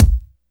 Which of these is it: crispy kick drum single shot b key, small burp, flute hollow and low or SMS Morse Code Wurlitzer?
crispy kick drum single shot b key